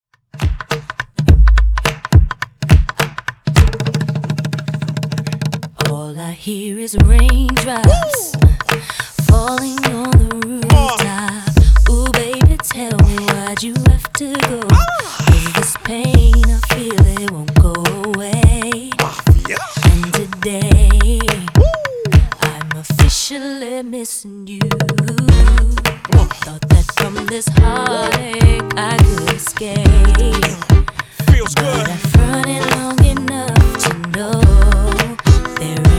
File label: 105 Bpm; Re-drum